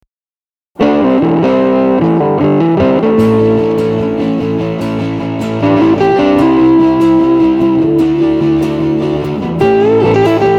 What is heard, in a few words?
guitar layers